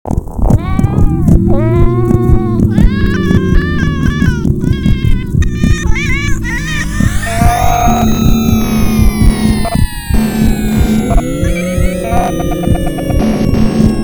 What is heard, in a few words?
Heartbeat; Catz; puss soundtrack